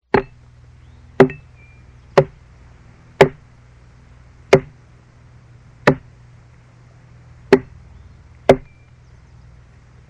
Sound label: Chopping Tree; SOUND EFFECTS1